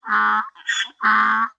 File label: natura error; Spik